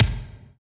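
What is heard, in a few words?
bass drum, Roland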